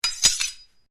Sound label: Blade Swish Low, Sword